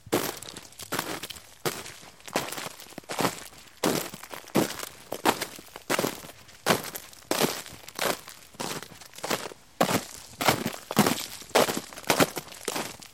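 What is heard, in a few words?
sounds of footsteps on ice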